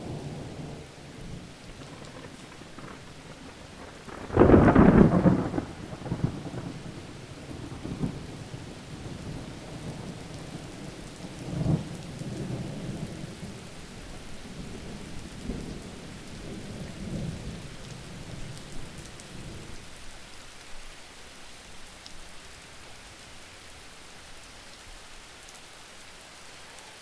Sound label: Lightning Strike